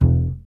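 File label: string contrabass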